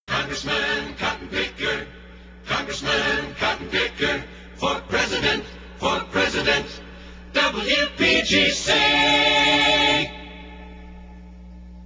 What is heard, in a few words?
jingles halleluah choir; congressman cottonpicker